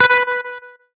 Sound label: hitsound note; cstrike; tf2